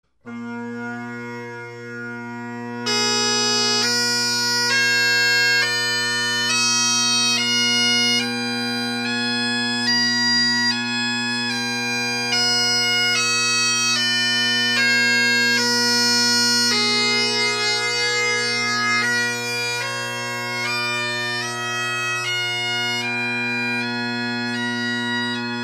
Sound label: scale